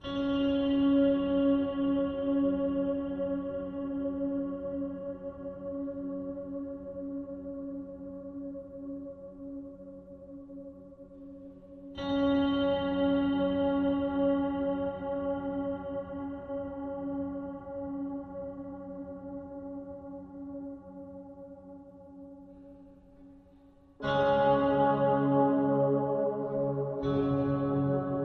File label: No Bass